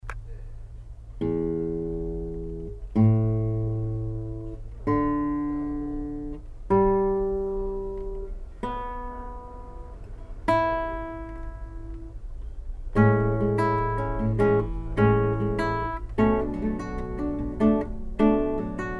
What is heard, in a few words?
nylon sample